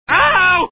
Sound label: scream; barney; simpsons